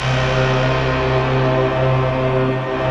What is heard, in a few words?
rough stack